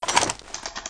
shotgun reload start

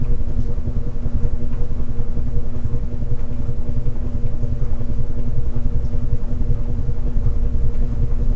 fan small

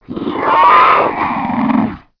zombie poison alert

